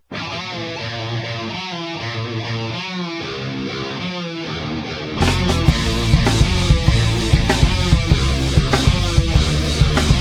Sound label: hip hop repente